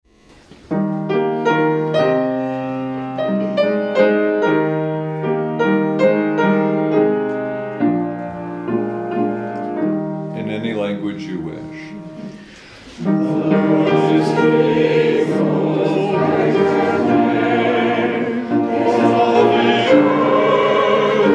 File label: hymn; The Lord is King